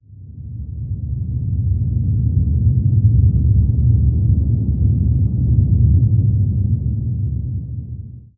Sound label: cave, ambient; minecraft; PixelPerfectionCE